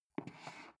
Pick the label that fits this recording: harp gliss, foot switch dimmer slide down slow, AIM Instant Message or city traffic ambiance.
foot switch dimmer slide down slow